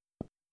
aufprall, Aufprall Feedback; jazz jackrabbit 2

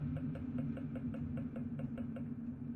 gehen klein; Machinarium; gamesoundopedia

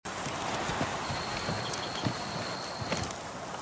abendanbruch, Die Fussstapfen sind sehr subtil, und verschmelzen mit den Hintergrundgeräuschen; The Flame in the Flood

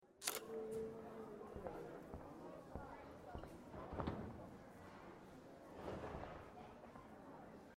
gang schule hintergrund; life is strange

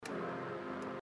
links; inside

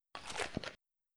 spieler isst; jazz jackrabbit 2

